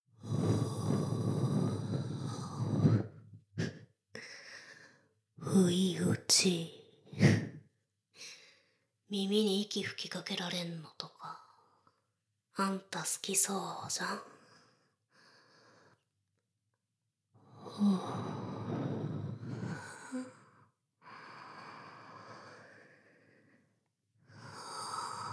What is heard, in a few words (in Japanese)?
耳舐め, ASMR; ダウナー風俗